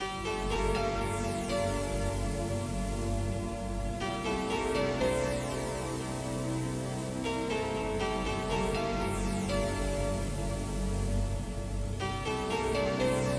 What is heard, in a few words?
chilling funk, 432 Hz